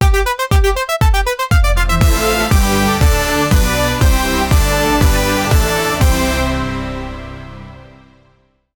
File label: a brief edm inspired fanf, dance